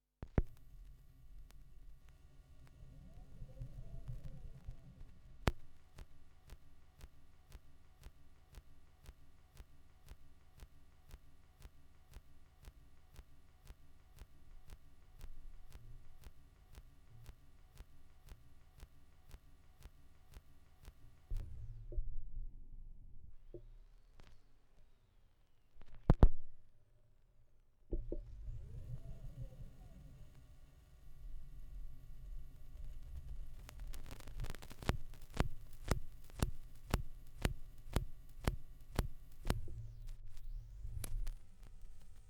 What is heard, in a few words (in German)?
schallplattengeraeusche, Vinyl